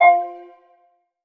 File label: Hang Up Phone